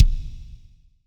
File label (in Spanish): BOMBO, percussion reverb; MARKYDRUMS